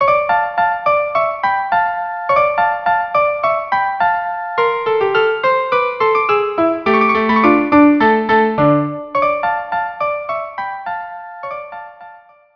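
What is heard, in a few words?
violin viola cello trio; Choeur des Gamins; carmen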